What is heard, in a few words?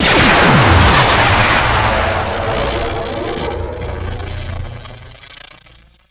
sentinel strike; Star Wars Clone Wars; XBOX; Xbox Live Effects